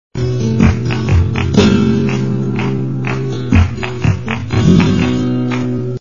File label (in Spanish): buleria SLOW